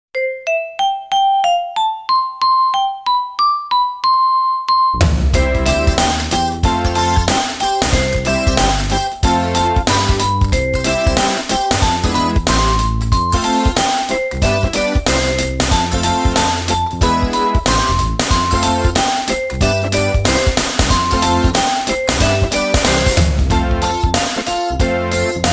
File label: Instrumental